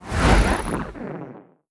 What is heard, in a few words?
sfx transport ship moving out; Stellaris; nemesis